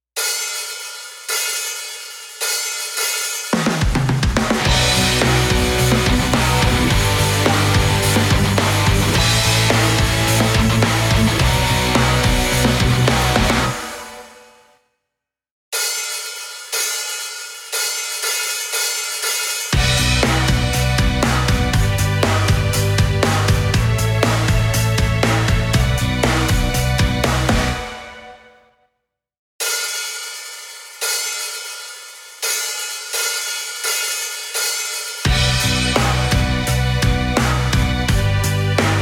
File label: karaoke